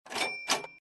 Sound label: cash